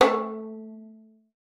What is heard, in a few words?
FING A3, VIOLIN; Xsample VOL-5